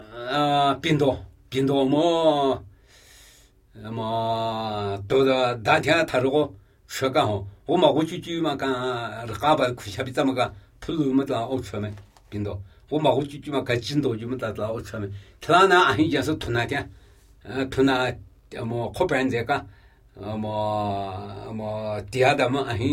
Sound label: Namuyi Tibetan Ritual Chanting